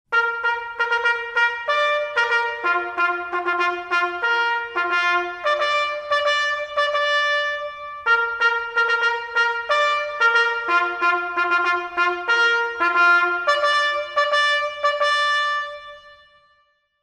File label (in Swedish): Tapto